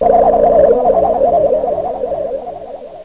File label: bleep